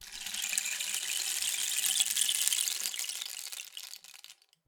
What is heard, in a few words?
Rain Stick, Percussion Samples